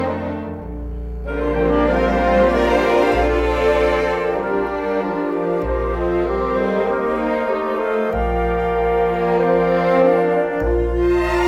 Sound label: Track Backing